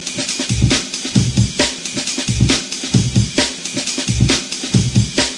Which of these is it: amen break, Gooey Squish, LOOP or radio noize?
amen break